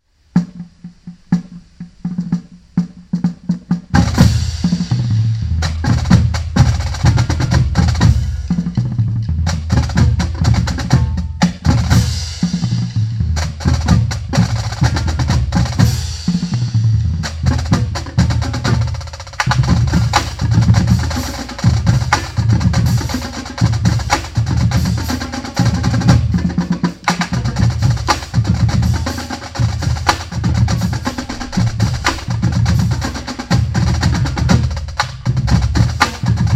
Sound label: Sticks and Swing, drumline